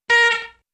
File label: Single Car Horn Honk; Various Single Car Horn Honks, Beeps, Chirps, Etc..